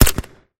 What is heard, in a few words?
vss, guns; resurviv